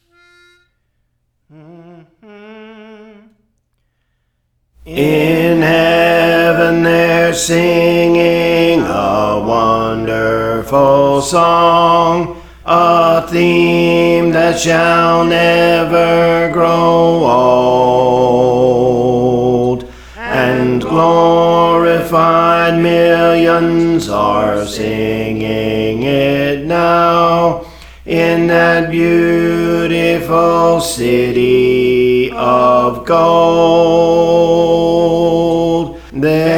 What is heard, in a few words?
Bass; Praise For The Lord Training